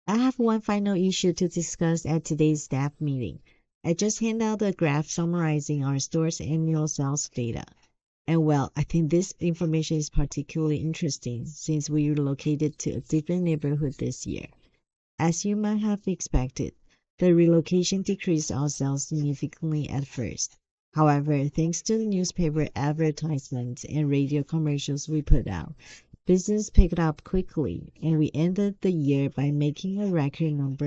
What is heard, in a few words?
babycry Feature ON